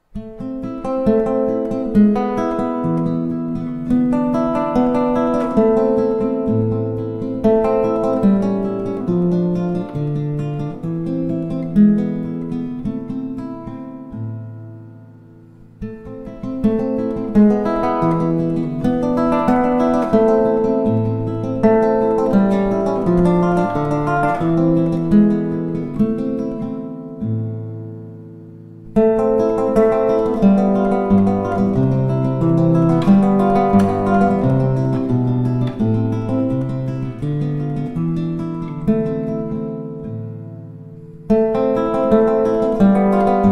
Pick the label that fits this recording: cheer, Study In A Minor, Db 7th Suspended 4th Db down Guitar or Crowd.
Study In A Minor